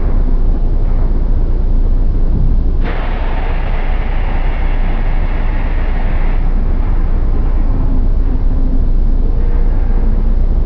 engine; rebar

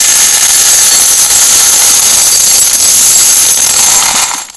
coins

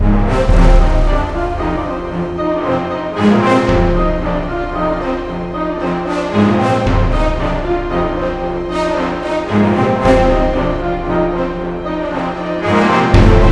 storage music

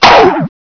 force field shutdown; ambience

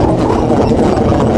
conveyor belt; ambience

